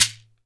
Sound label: SHEKERE, Synclavier Percussion; ILIO